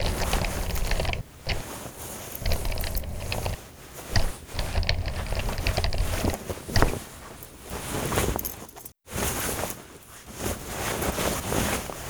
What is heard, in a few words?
laptop stand idle; Modern Warfare